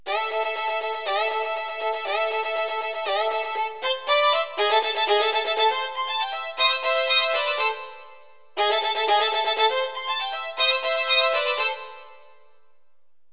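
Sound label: fiddle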